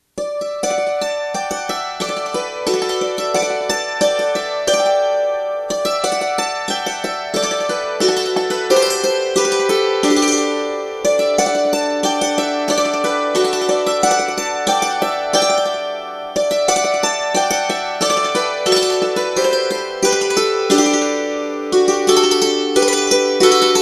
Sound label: Waterfall